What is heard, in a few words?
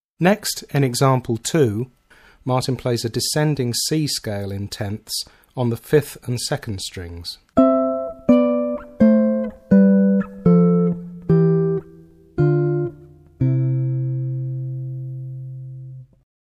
C Scale Descending, Guitar